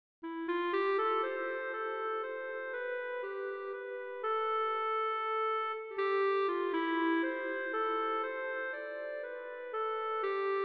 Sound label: melodica